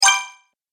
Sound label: Quick Little Spell, Magic Sound Effects